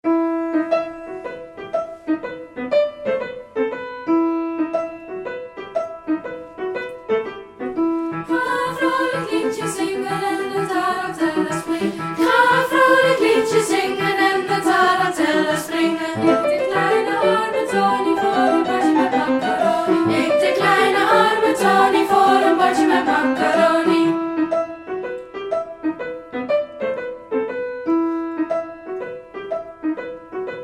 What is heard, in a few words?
Tarantella